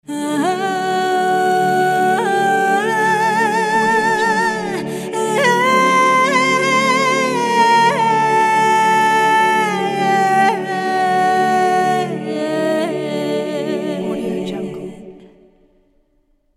arabian woman voice logo

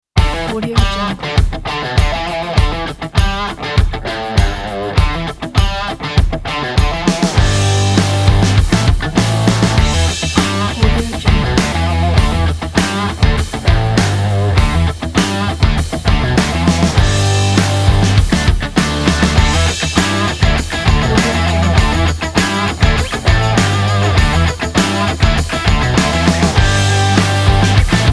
action rock energy

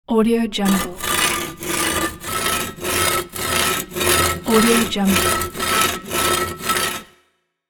sawing wooden log

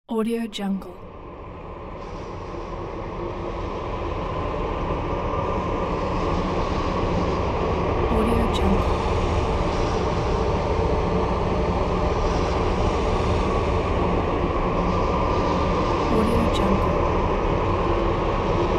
ghostly hell ambience atmosphere

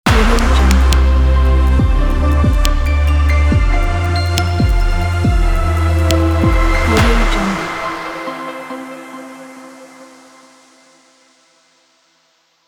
corporate technoligy logo

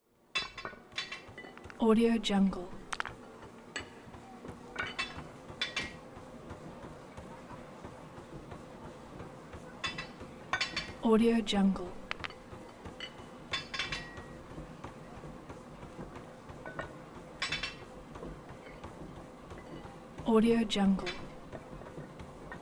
gym ambience

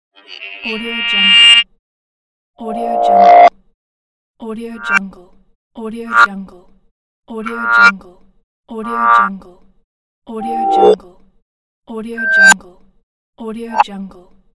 tension

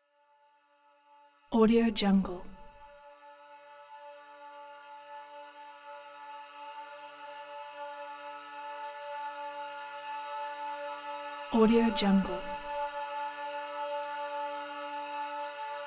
tonal singing american indian; collanderson

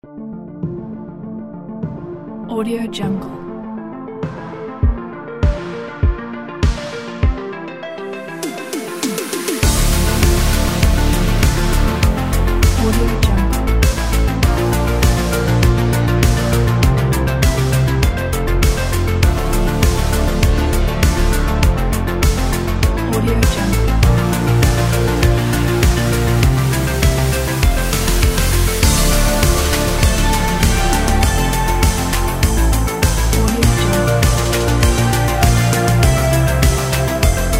synthwave retrowave

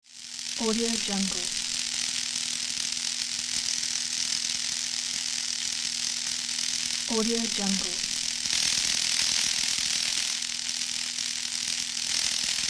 electricity

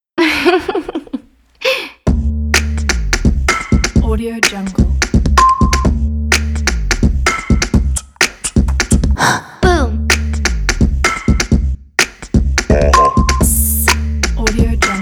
urban voice bass percussion logo pack; redoctopus

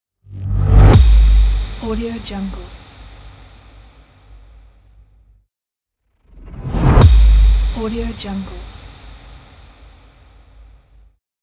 boom impact

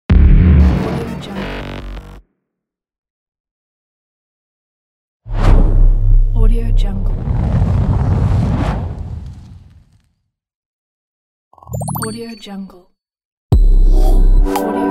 logo pack

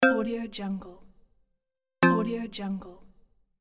notification pair

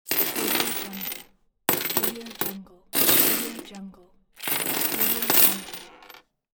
buy item

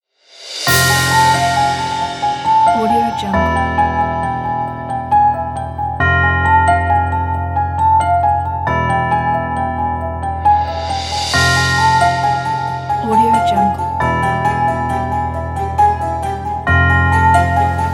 halloween

